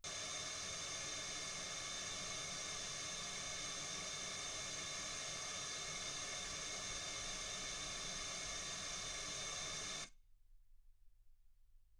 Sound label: wgn